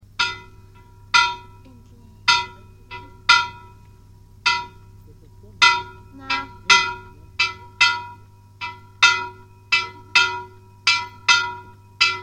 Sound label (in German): Iggensbach, Sterbeglocke